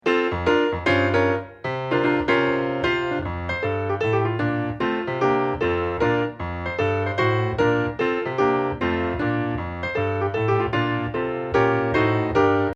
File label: accomp; good news chariots a-comin